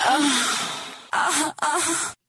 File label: female orgasm